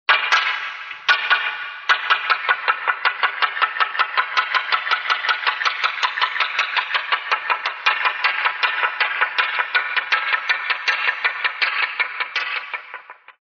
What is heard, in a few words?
simandron